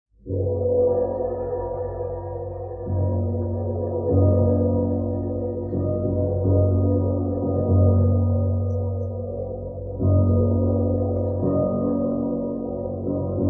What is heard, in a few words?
Gong Denoised; Redunoise